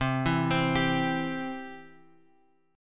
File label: C Major C down Guitar Open G